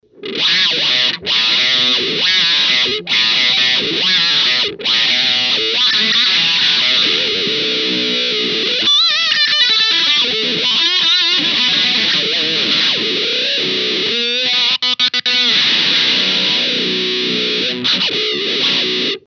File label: VOX WAH V847 A Lead